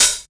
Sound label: HH OP, HH OPEN; USB Soundscan vol.20 - Fresh Disco House I